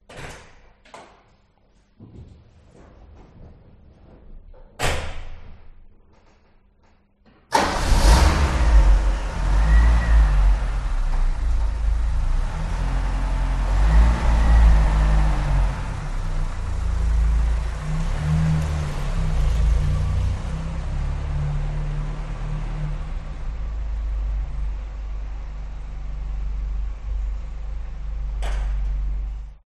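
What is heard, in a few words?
exterior leaving garage; detunized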